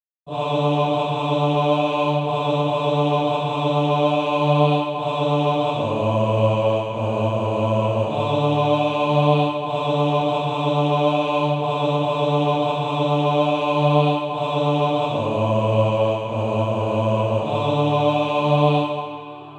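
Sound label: bass; Christ Receiveth Sinful Men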